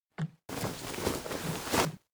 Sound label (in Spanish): animacion terminar subir escaleras; yesterday origins gamerip 2016 switch ps4 pc ios macos